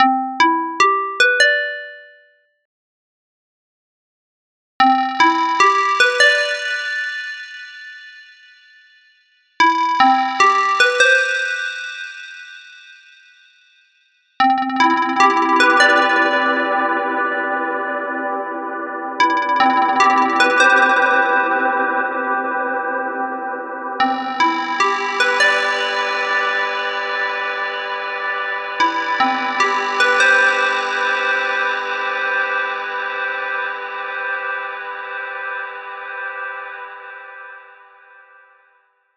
FM Pluck; Droplet

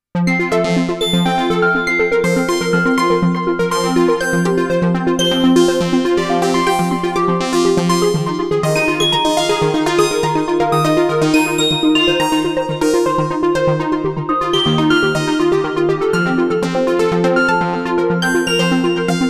KORG Odds Hybrid; prologue

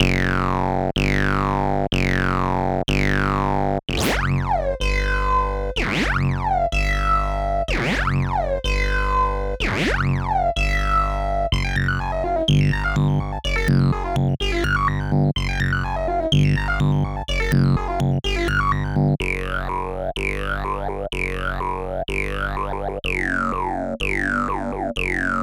Distorted Acid; Flow

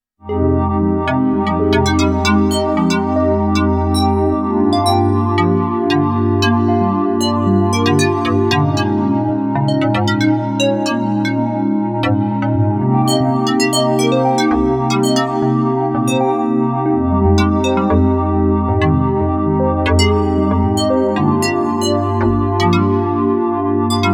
KORG Odds Hybrid; prologue

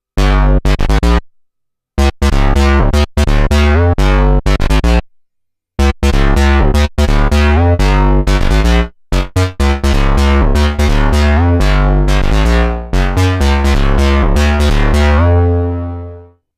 Tube Bass; KORG Tube

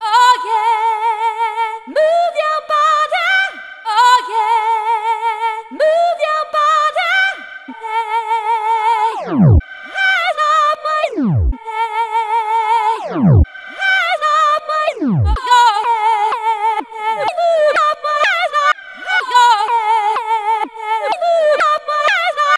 Female Vocals

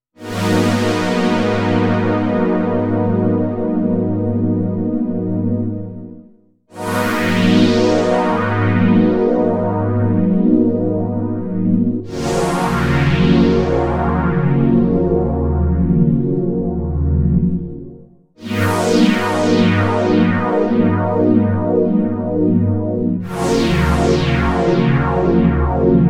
Korg Whirl Lush Strings

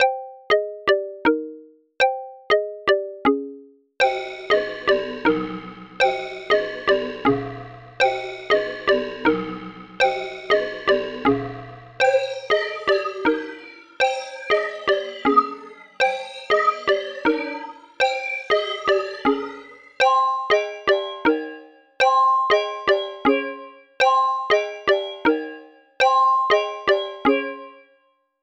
Reverb Simulations; Singularity

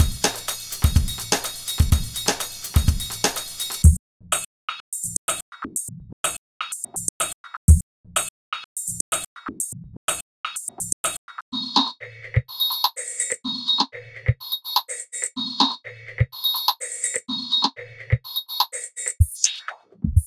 on Acoustic Drums

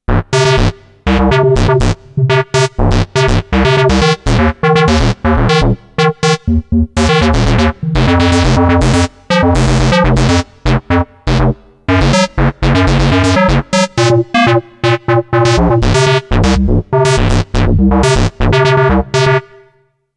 KORG Odds Stochastic Ring Modulation

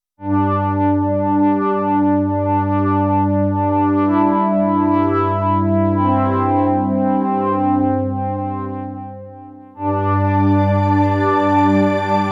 KORG Luminance Majestic Pad

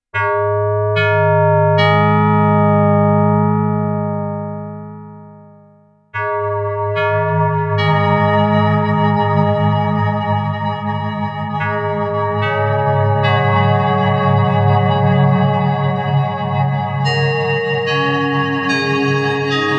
FM Synth; Korg; Albedo

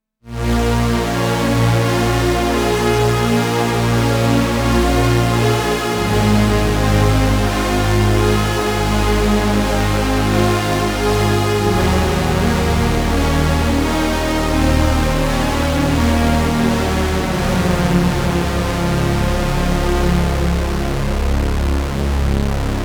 Unstable Pad, korg; dense

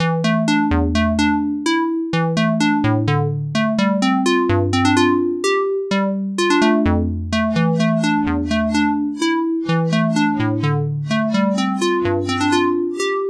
Synth Pluck; Vague

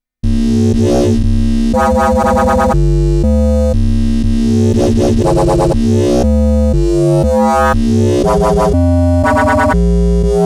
KORG Odds Stochastic Waveshaping

